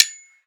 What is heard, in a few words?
dagger